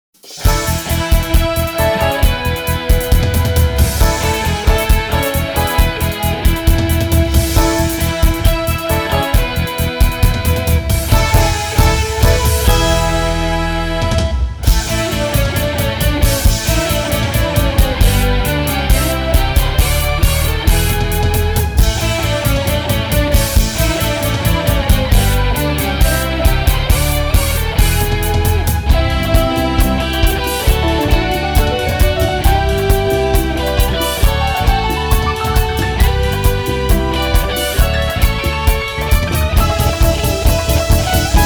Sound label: orcrock